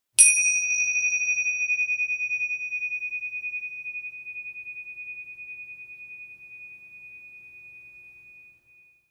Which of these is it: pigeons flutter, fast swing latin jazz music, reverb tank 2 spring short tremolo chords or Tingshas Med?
Tingshas Med